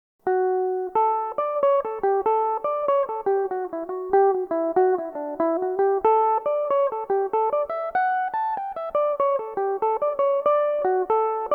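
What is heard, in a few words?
D major, irish mandolin, tunes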